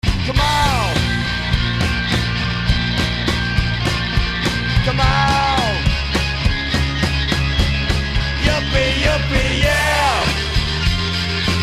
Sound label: solo